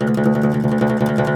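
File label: OUD tremolo, Plucked Strings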